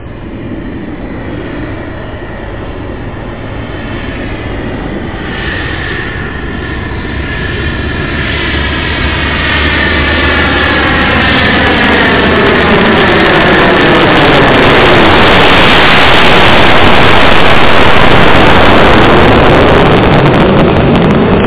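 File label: jet takeoff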